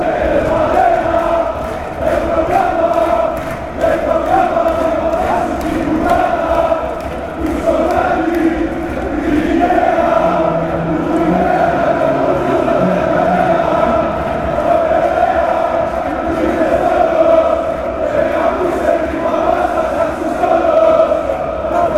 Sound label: panathinaikos chant